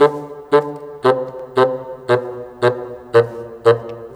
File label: Bassoon, Orchestral, Rock Pop